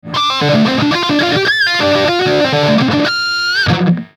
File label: BB3 blues OD, Marshall Guitar Effects Pedals